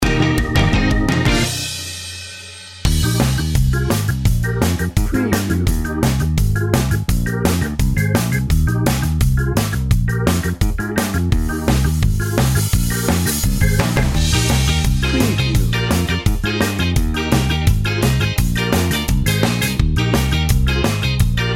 ska party bed no horns

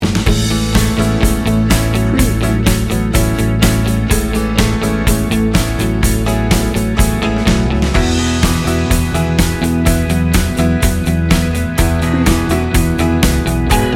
warm upbeat soft rock

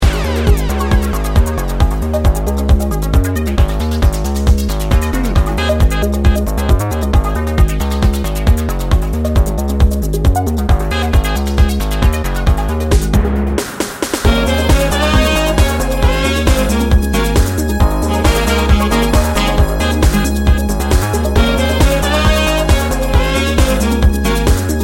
fashion technology

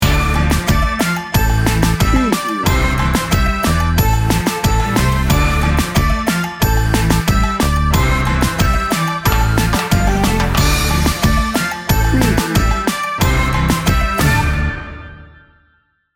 reggaeton happy upbeat; Upbeat and bouncy. Featuring pulsing synth textures and a happy Reggaeton groove; 15 second

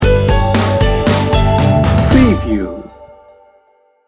sting bumper

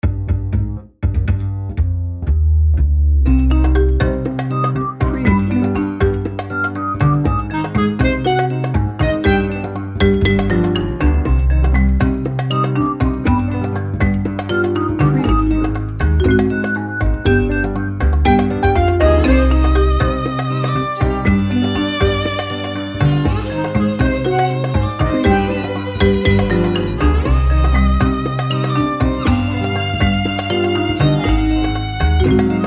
tango mischief

